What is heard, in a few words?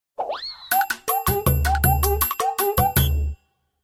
Swipe Start; android ios mobile windows gamerip 2013; Papa Pear Saga